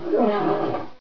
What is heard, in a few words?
hurt Golem